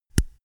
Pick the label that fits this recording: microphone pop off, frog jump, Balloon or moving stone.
microphone pop off